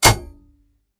Heavy Switch Click

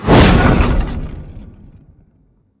Gas Tank Explosion; explosion blast

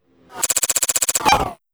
Retro VHS Glitch

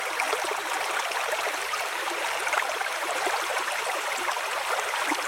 River

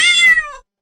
Angry Cat Hiss; Reaction edits

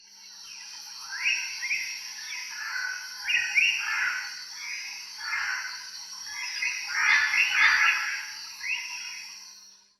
Tropical Jungle Ambience; forest jungle ambience

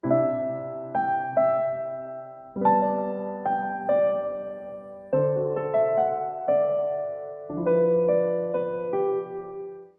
Christmas Piano Melody

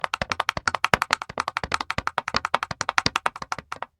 Running Feet Sound, cartoon

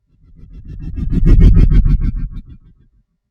Slow Motion Pulse Whoosh; slow motion transition

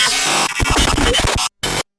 Glitch Reveal Sound; facts channel

